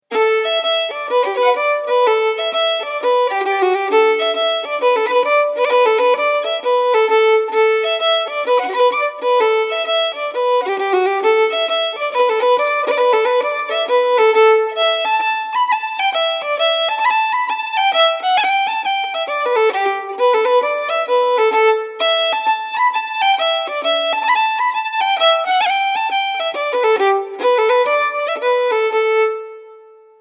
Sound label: Slide; Dan OKeeffes